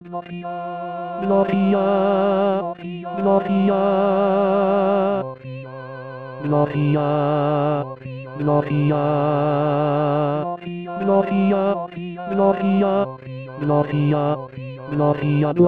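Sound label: Christmas Fanfare, tenor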